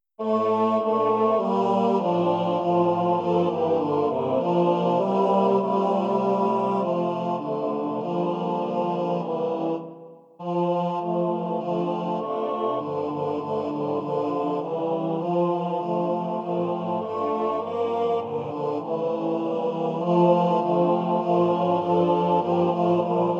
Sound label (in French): Basses; Basses Hautes